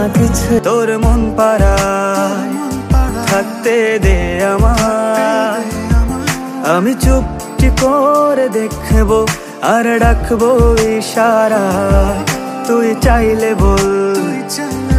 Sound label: bengali ringtone